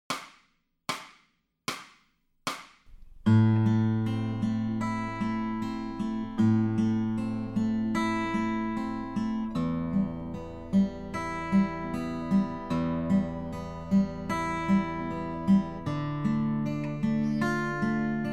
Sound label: Fingerstyle, acoustic guitar; Grade 1